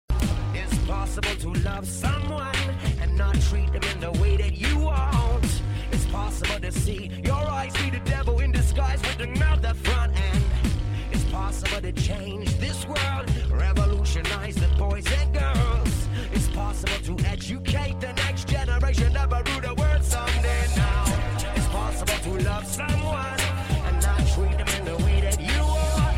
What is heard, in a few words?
Dub Fx